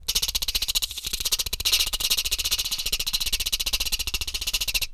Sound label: Timer